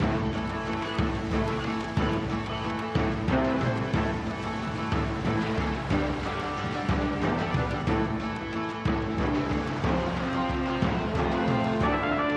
captain background

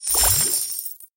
fish large coins collect; fdfish

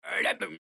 rundance; gonzosquest; gquest

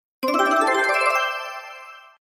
free spin charging

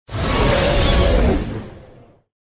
Dragon Win; longhudazhan

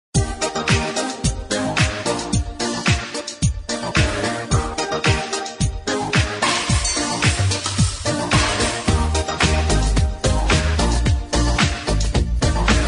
music forest party bg

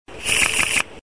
sendcard

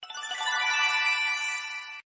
particle collect

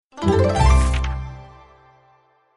Scatter Win; goldvase

